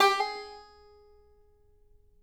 BANJO NOTES, AB4; Sampleheads; Whole Lotta Country 1 & 2

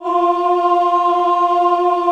voices choir; Sound & Vision - Gigapack I CD 2

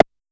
Pollard Perc, Syndrum

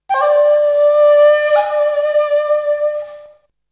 Flute; ZERO G - Datafile DISC 3

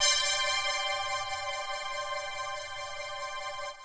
125 pad E, Keys, Nu Jazz House

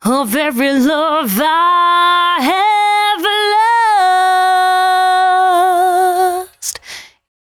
113bpm C#, Velvet Vocal; Zero-G

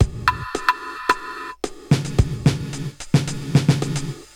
80s Gated Verb Korg MR-16 (110BPM), cyborg beats; musicradar

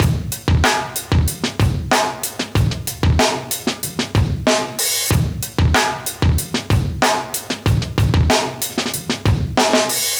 94 live breaks

